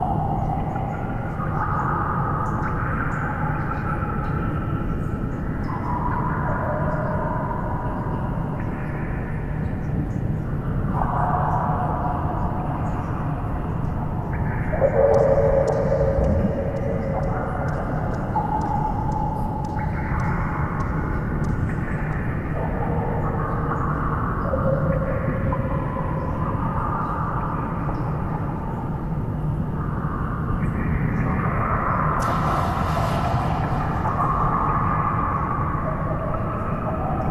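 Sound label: Ambient Sewer Loop; silent hill homecoming; ps3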